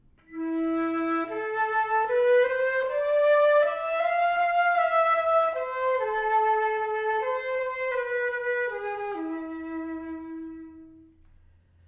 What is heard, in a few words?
flute omni